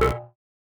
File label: Sound Dialogue Close